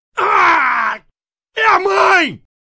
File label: aaaahhhh